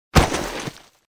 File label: roll; WarRock